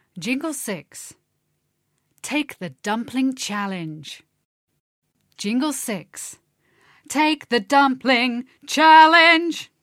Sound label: Jingle; poems and songs